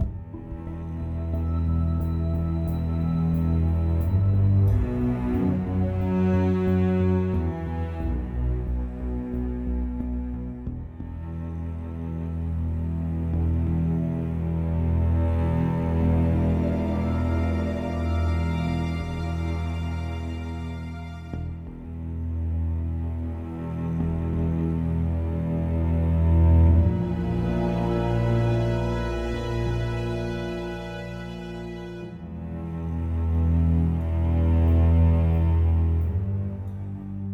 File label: story sneaking; Shadowhand; macos windows gamerip 2017